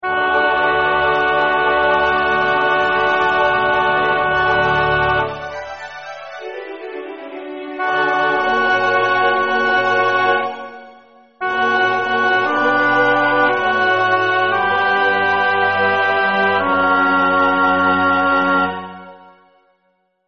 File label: Alto; Haendel